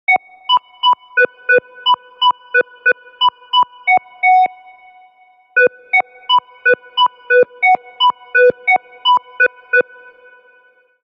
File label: Sine B Minor 87 bpm; MIDI Clips; Tonal; Melodic; Ableton; Factory Packs; Chop and Swing